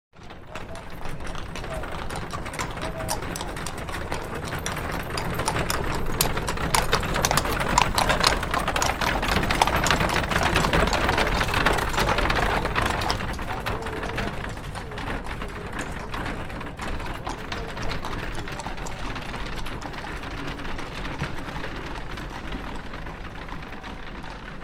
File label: Horse Drawn Carriage Slow By; Horses Carriages and Traps; Hollywood Edge Historical Series